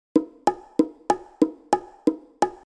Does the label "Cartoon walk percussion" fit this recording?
Yes